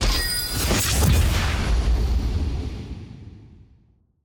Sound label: readycheck outro accepted